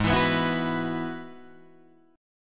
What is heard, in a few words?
G sharp Minor 7th Sharp 5th G sharp down Guitar Drop D